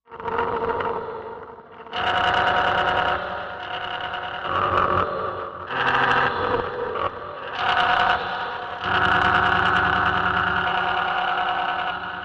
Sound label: Needs Maintenance Mac, static